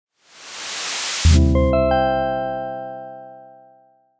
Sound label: logo grand reveal